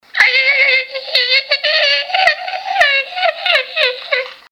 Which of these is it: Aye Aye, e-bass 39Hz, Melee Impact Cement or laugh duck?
Aye Aye